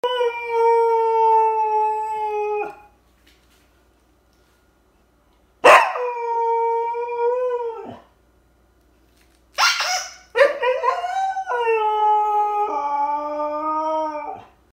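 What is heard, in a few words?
Husky, howl; زوزه هاسکی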